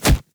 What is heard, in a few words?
Hit Body Hard; Afterbern Democralypse; Windows